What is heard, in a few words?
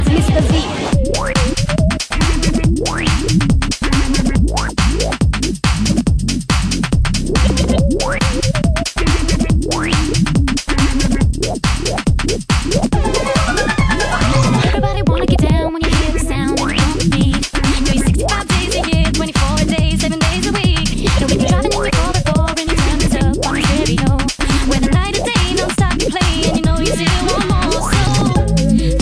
Bassline